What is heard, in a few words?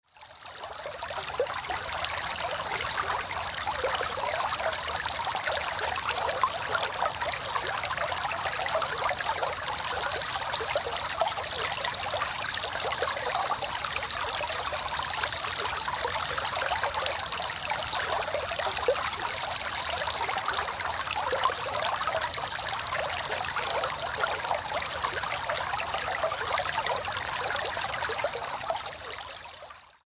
Fountain